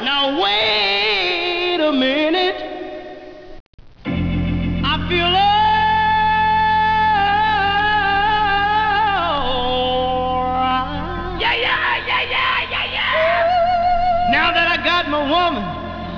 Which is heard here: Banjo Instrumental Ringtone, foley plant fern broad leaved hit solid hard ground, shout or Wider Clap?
shout